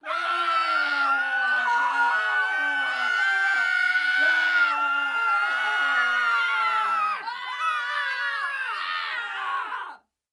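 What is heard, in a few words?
crowd loop group scream screaming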